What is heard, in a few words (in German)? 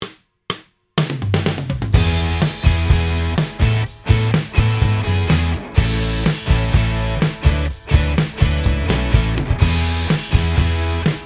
Einzelsaiten Playalong E A; E-Gitarre; Dein Erstes Spiel auf der E-Gitarre (Grundkurs 3)